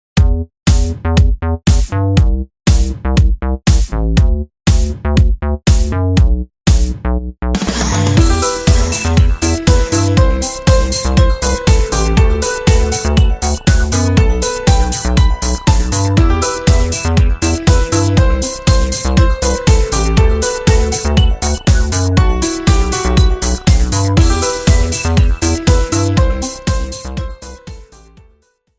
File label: electronica call notification tone ringtone